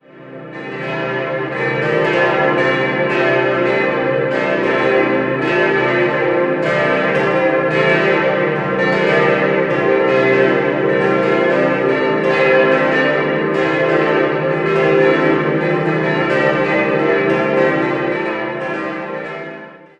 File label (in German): Ruhstorf an der Rott, Glocken, Pfarrkirche Christkönig